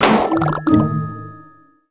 Lose With Pop

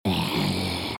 Zombie Walker Kid

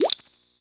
Revive Reward; subway surfers city sound effects; Android, iOS

